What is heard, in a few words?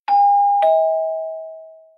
Doorbell